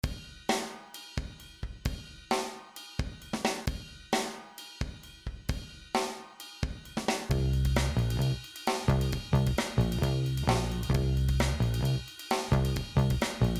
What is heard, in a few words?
Noodle Piano; garageband